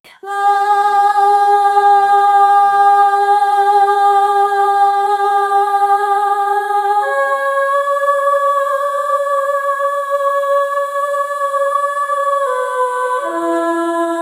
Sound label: describe an auto tuned fe, carnival